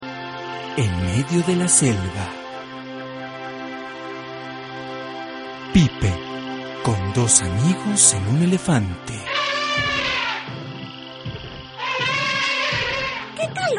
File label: pipe